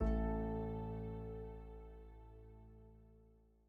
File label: Endingnote; Theatre Endingnote